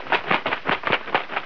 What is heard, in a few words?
flap; Tetris Collection for Windows 98; kmdemo